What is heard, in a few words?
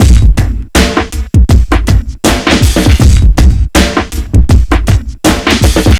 Street Tip, R&B Drums; Sonic Foundry (Sony Creative Software)